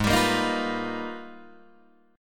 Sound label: Ab Minor Major 13th, down, Guitar, DADGAD